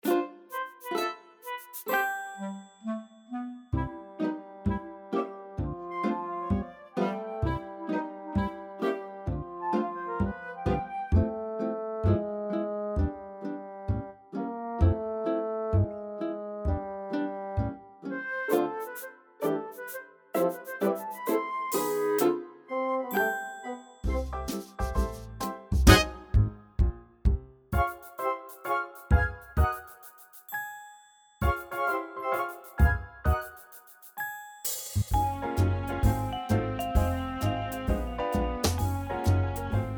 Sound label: accomp